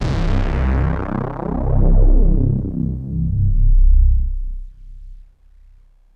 Bass Synths hits and octaves

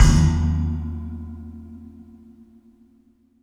RACK STRIK, PERCMETALIC

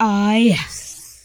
VOX, VOCALS; Ueberschall; Jam Box

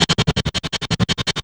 loop, 165 BPM, drum & bass; Ueberschall; Drum & Bass Resonance

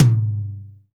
TOM, Drum Dry; East Collexion; Drum 1

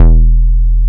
BASS R, Drum & Bass 160 BPM

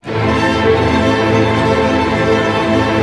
STR ARP MI, Symphony Orchestra, STR Arpegg. Minor; Roland LCDP08 Symphony Orchestra; STR Arpeggios

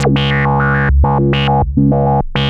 HVCF C#2; CMP - Analogon; SH-VCF

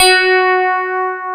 PIA DX E-PIANOS

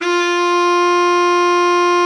BARITON, Sax; Giga Samples Collection

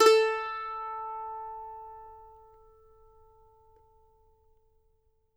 MANDO NOTES; Sampleheads; Whole Lotta Country 1 & 2

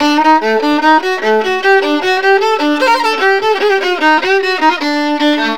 VIOLIN, Balkanish Traditions; 172

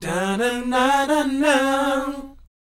BOYZ G#, Vocal Planet R&B & Dance; ILIO

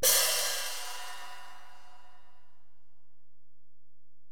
HiHat, Alesis DM-PRO

